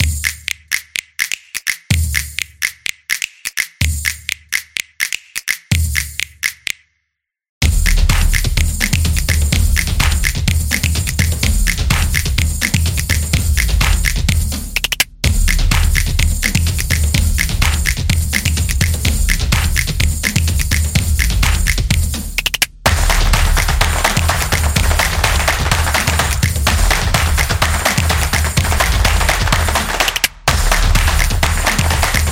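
The Claps Typography, Football percussion background music inspired by samba rhythm, stadium energy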